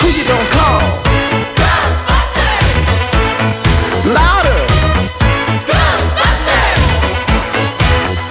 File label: Vocals - End Chorus Vox; Louder